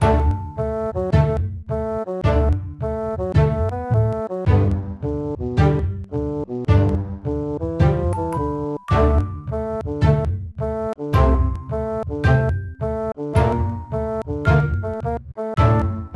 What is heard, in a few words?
Music Cinematic; my animal centre baby animals 2009 nds gamerip